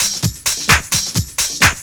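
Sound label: drum loop 130; House; Best Service ProSamples vol.10 - House; AKAI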